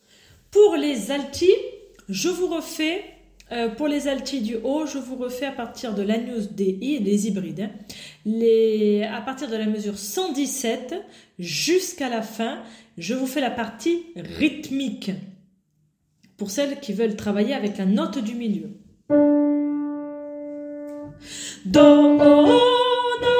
Partie rythmique agnus hybride et alto

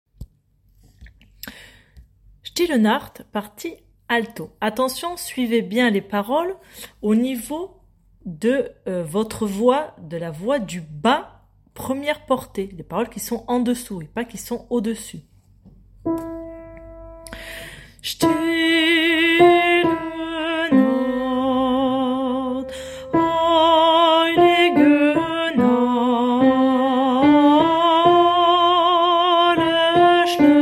Silent Night partie alto, choeur; Still nacht

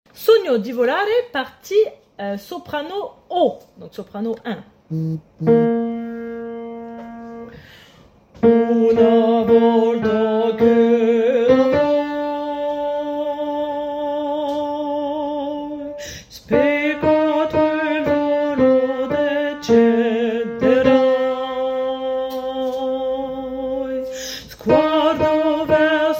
soprane

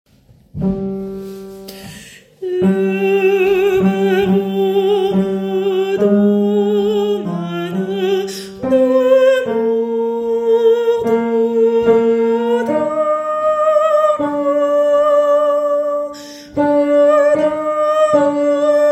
partie tenor

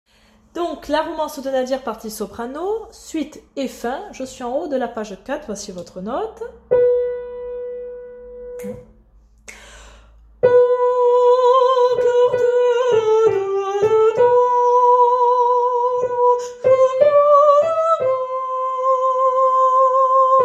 partie soprano

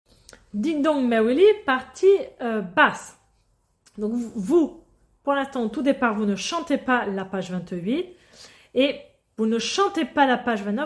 basses

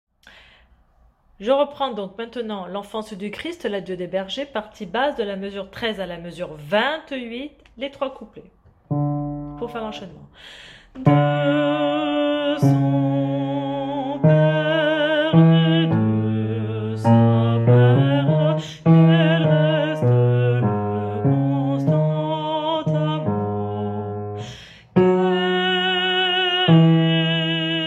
partie basse

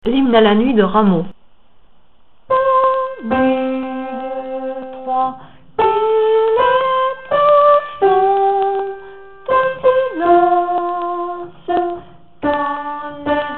Homme; Hymne A La Nuit; Rameau

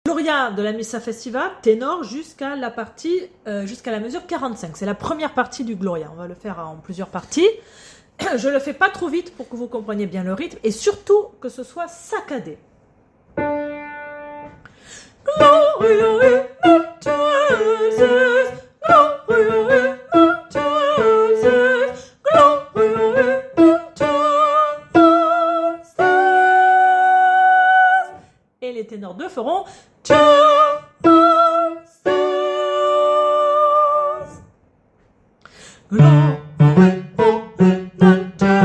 tenor

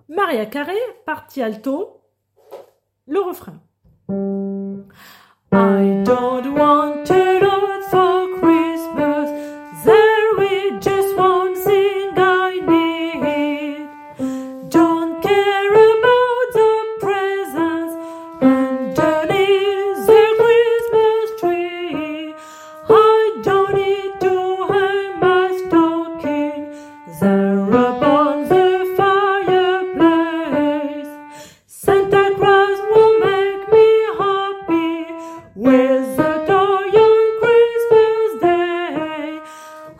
altos refrain

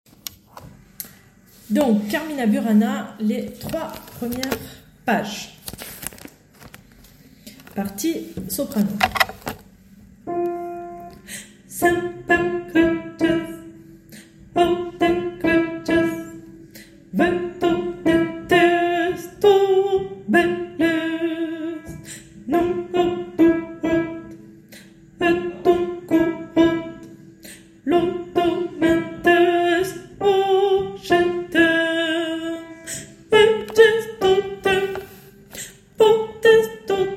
soprano; Oh fortuna 3 premieres pages

